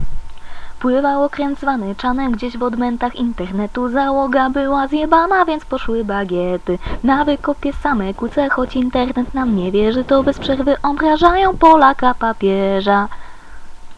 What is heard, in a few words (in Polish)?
śpiewa